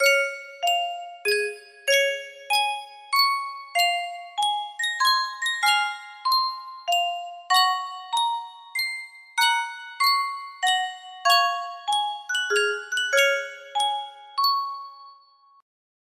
yunsheng music box

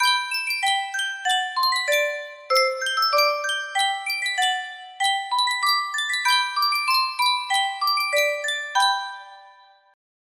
Sankyo miniature music box

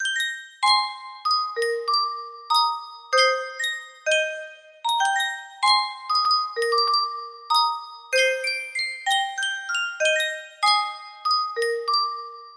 Sankyo music box

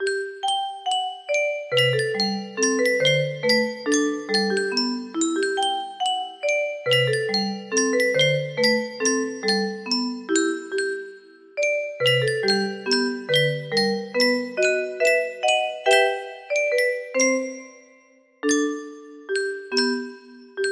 music box, melody

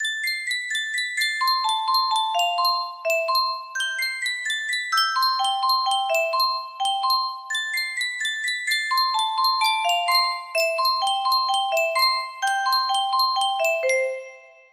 yunsheng music box, melody; obabi peluche oraibi

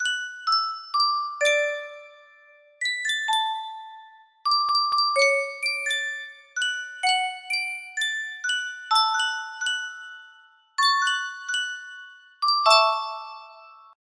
music box, music box melody

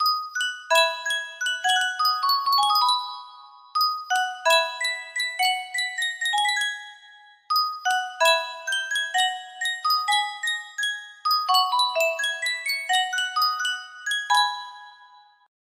sankyo music box

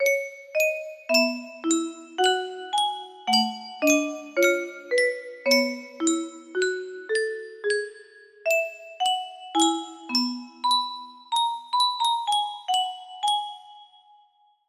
melody

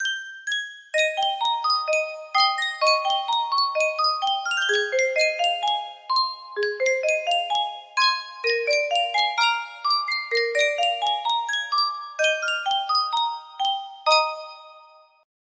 yunsheng music box, music box melody

